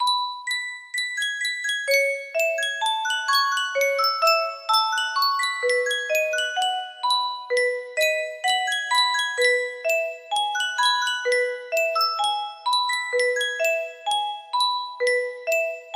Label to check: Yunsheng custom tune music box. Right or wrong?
Right